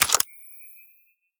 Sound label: battery use